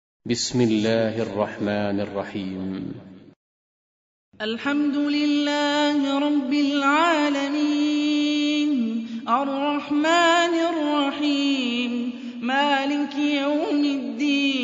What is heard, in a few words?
Quran; Hani Refaey